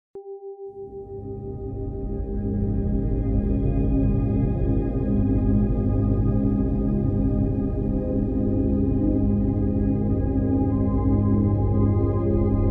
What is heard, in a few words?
Anxiety Release Binuaral Beat Meditation; Anxiety and Stress Release; Binaural Beats Meditation Music